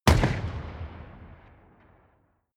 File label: shoot distant